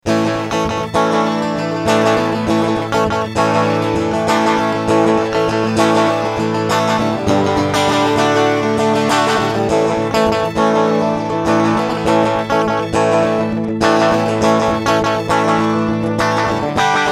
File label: Slapback Delay